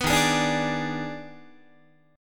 Eb Major 7th Suspended 4th Sharp 5th, Guitar, chord; strummed; 14,11,11,12,12,13; EbM7sus4#5